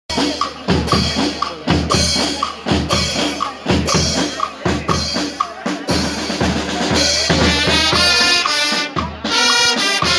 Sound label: french march; BURHANI GUARDS LUNAWADA; instrumental